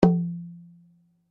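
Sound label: Drum Flat Skin mezzo forte, Drum heads isolated from drum shell; Musical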